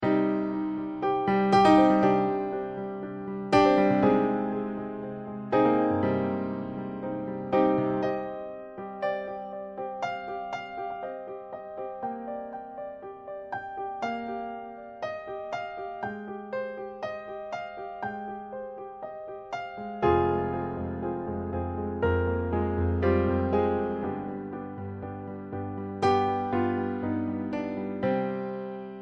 Garageband Piano

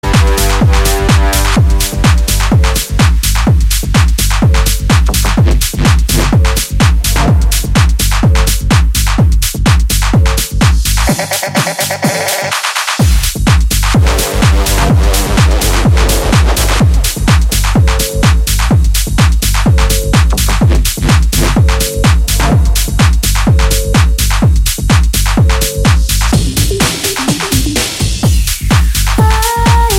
tech house ringtone

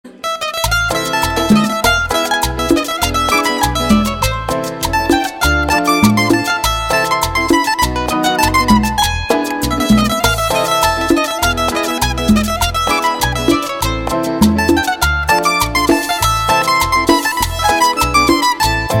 Cumbia

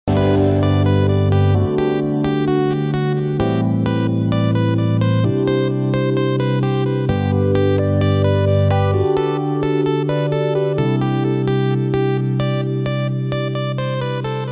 Soothing Instrumenta; Instrumental

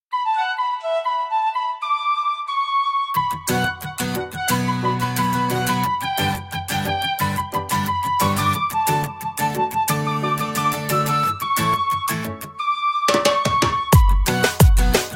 flute remix

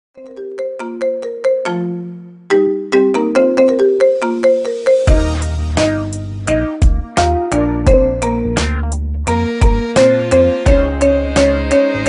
Marimba

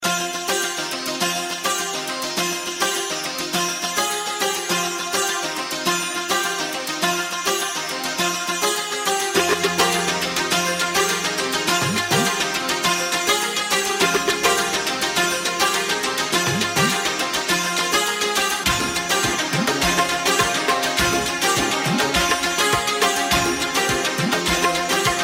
Romantic Ringer

